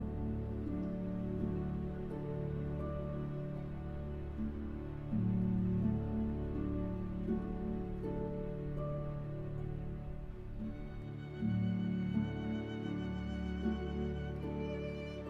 Meditation for violin and orchestra; Thais

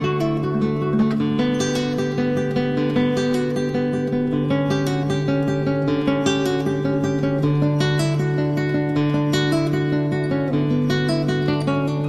Cello Suite No. 1- Prelude

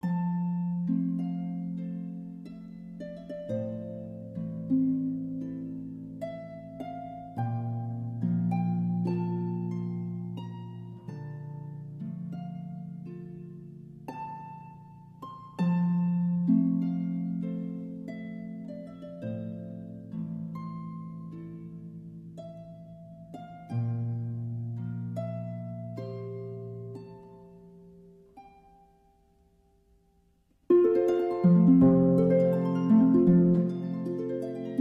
Harp Sounds 3 Christmas carols

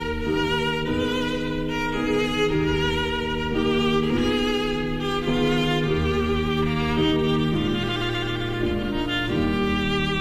Sicilienne for violin and piano

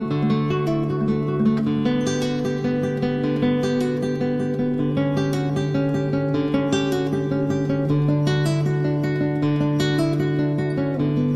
Cello Suite No. 1- Prelude

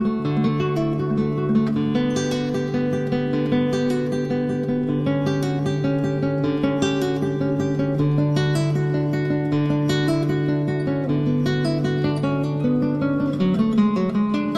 Cello Suite No. 1- Prelude

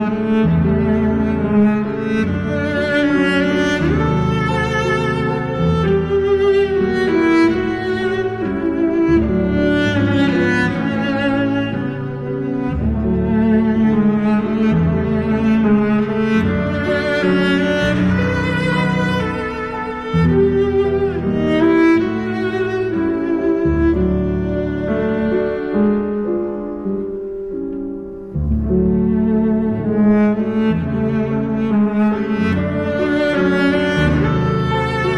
piano, Melodie In F; arr. David Popper

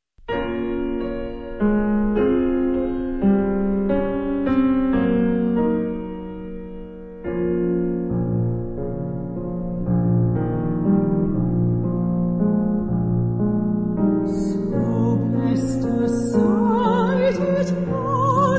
piano, English Folk Song; So Blest A Sight

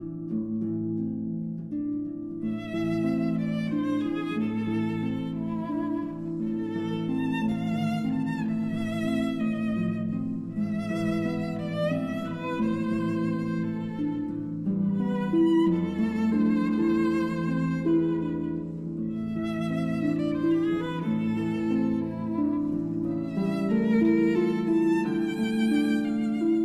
Berceuse, for violin and harp

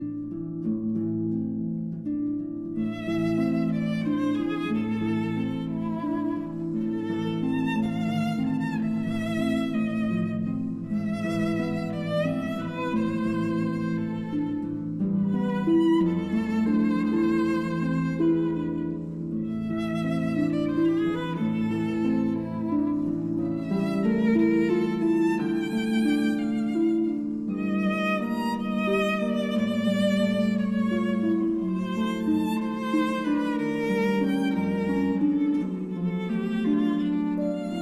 Berceuse, for violin and harp

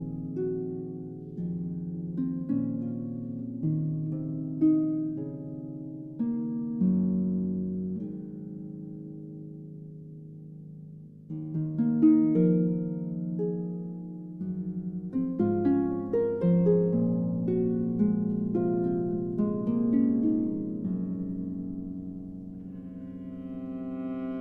Harp Concerto Lullaby

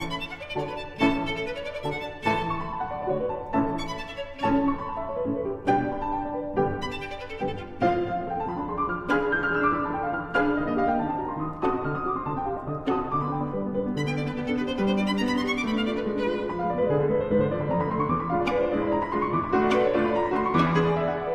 Violin Sonata in A Major Allegro; Faure, Gabriel; No. 1; Opus 13- Mvmt 3

